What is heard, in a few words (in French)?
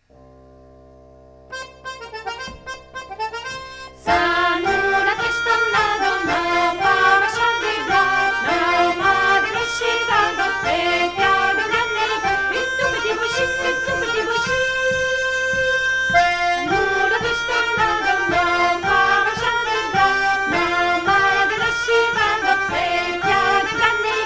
Polka piquee Vx Instr Fa